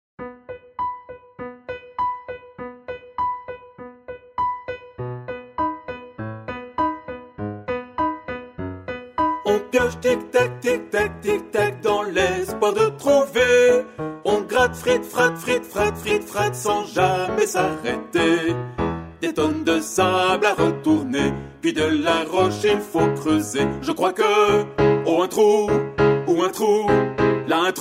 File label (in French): TUTTI